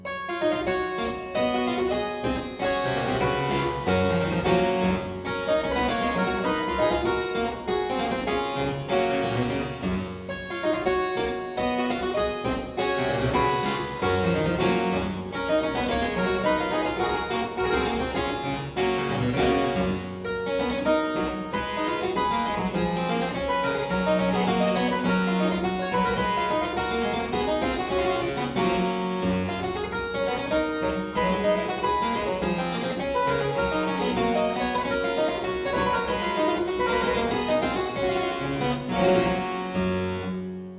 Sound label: Aria in G major Partita 23, Harpsichord